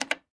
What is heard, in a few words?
quick Stop; jack and beanstalk